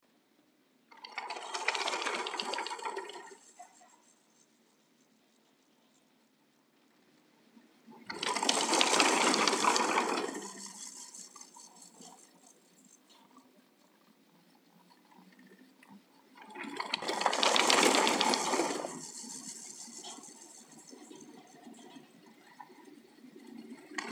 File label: Sessellift Arosa, Ski Chairlift